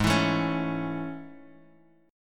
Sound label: A Major 7th G sharp